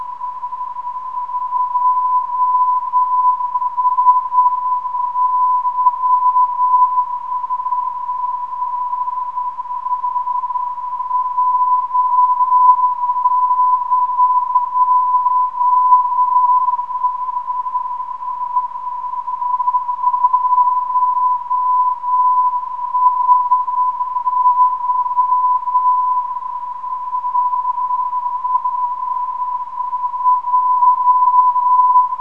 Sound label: jdy 331khz, ndb